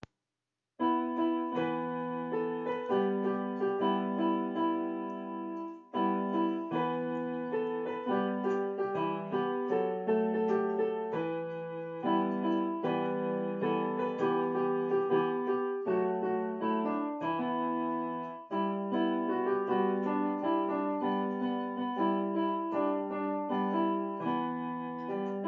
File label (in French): Un merci à chanter (version instrumentale complète)